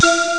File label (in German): floete; ST-XX Amiga Soundtracker Sample Packs